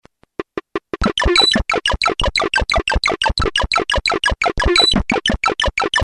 MZ-700; Painful Man

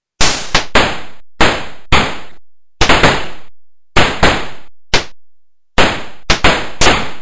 X1

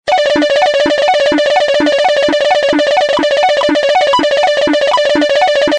MZ-700; Tiny Xevious

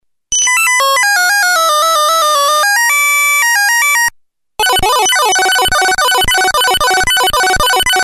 Arcade; Streaking

